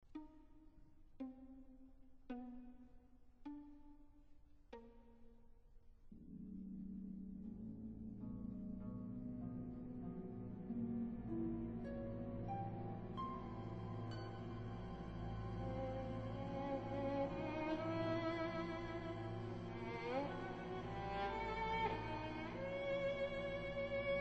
Violin Concerto; 2nd Movement

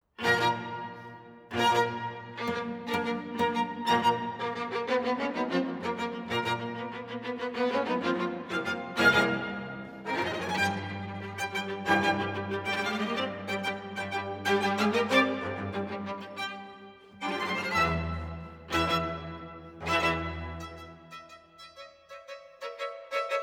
String Quartet no 1 3rd mvt

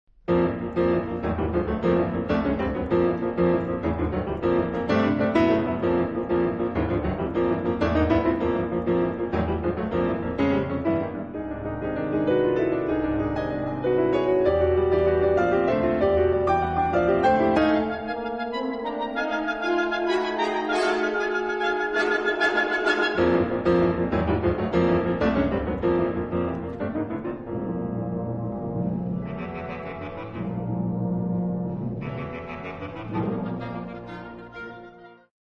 Concerto for Piano and Wind 3rd Movement

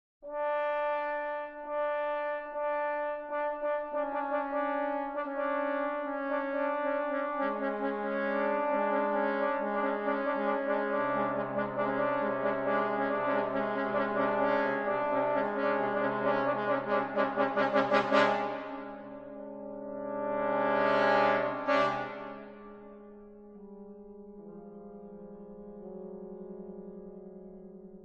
Sonata for Four Trombones

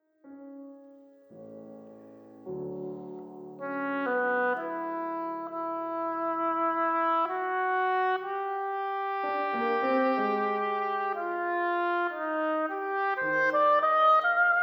Oboe Sonata 2nd movement

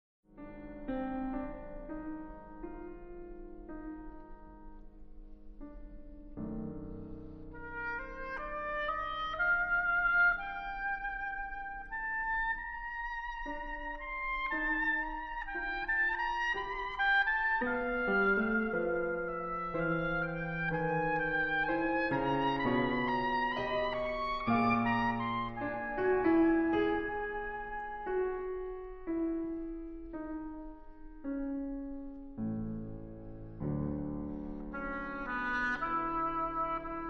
Oboe Sonata

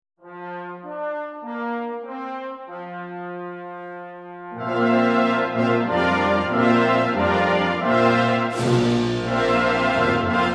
Variations On Laudate Dominum

